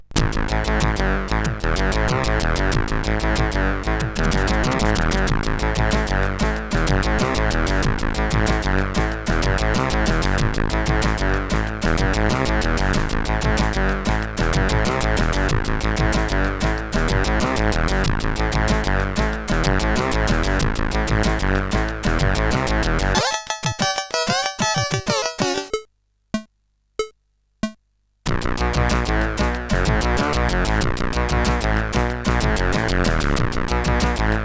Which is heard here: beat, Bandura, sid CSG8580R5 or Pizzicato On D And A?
sid CSG8580R5